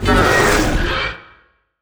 Monster Screeching; MiSide

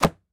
Book Hit; MiSide

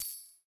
Money; MiSide

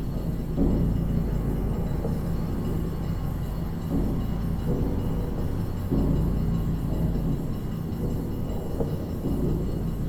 Knockers; Game rip; MiSide

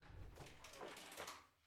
Door Ending Close; Game rip; MiSide

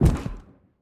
Fall; MitaFall; MiSide

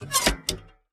Switch PC; Game rip; MiSide

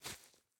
Spoon Coffee; MiSide

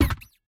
Hammer Kick; game audio; Mita; MiSide

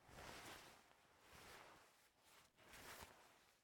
Drop Glasses; game audio; Mita; MiSide

